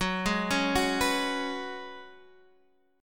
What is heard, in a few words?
F sharp Suspended 2nd Suspended 4th, F sharp, guitar chord